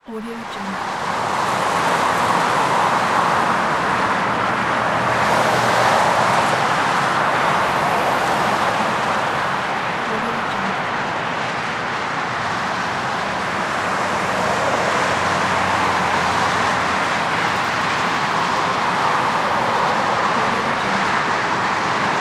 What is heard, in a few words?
highway sound